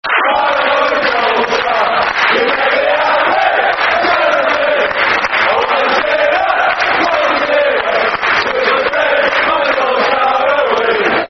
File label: Football Chant (Soccer Song), Man United; You Are My Solskjaer